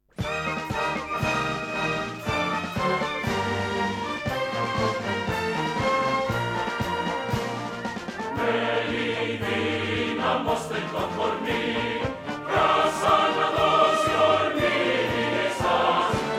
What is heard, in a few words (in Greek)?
ΥΜΝΟΣ; ΚΓΣ